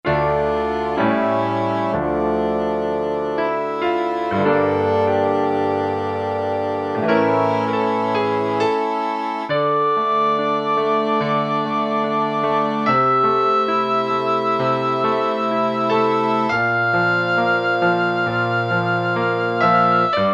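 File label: keyboard Piano Winds; E-mu Proteus FX; Factory Presets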